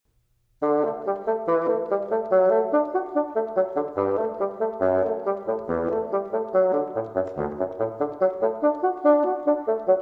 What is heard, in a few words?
Bassoon; Pulcinella